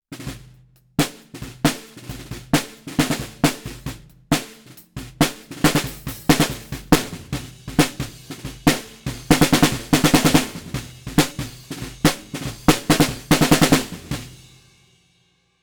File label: Snare Shoot Snr3 Bottom C451